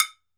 TOKE BELL, African Percussion; E-MU Producer Series Vol. 7 – Old World Instruments

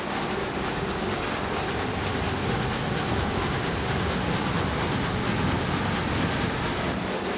Moving Noise 130, Tempo Loops 130bpm

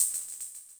Hhat, Hihat; Equipped Music; Loungin House

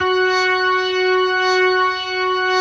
F#4S, B3, Vintage Keyboards

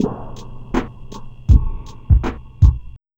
Subtle Bumpers, Fx; Sonic Foundry (Sony Creative Software); Esoterik Beatz

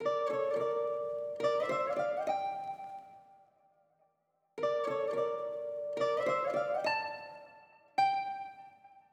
Fmaj 105BPM Ukulele; 99Sounds; Monosounds; Music Loops; PANDORA BOX!; Smoking Hot

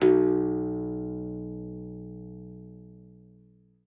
B1, guitar; Xsample VOL-2

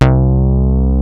OSCAR BASS, OLD SYNTHS; Rubber Chicken Software Sample Bank

